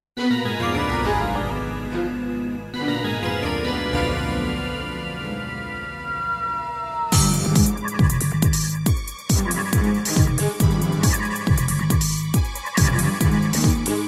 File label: instrumental